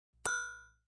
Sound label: Ping; Pings; multimedia